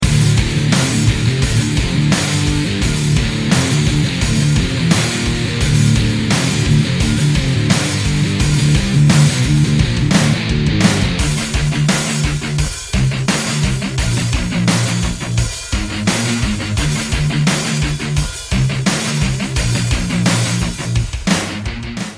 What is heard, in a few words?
Metal